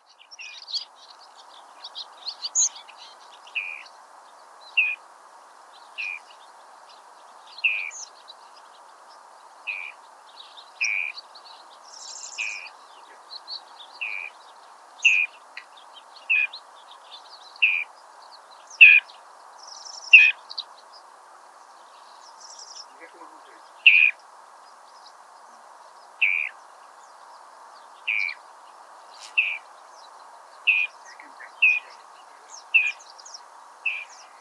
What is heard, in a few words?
Rufous chested dotterel